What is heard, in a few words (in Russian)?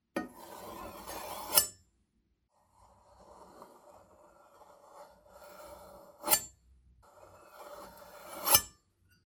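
три варианта ножом по предмету с характерным звоном лезвия в конце; Звук ножа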